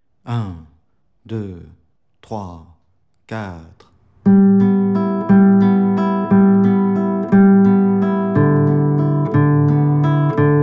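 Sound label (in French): arpege ascendant 060bpm, guitare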